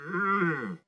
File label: crop reindeer